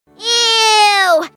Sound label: ewwww